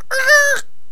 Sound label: chicken die; megaglest